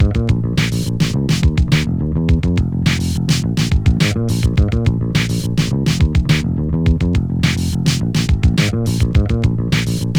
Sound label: Load Loop; NBA Street; GC; PS2